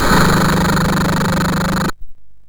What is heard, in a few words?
sid MOS6581R2